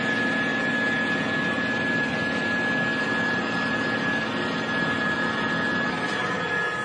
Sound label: Rheem Air Conditioner Whistle